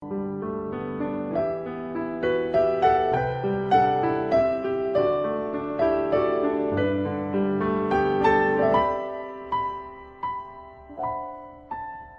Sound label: sorrowful piano ringtone